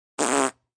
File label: Raspberry, mouth FX (fart); (human voice); Exclamations; Voice Box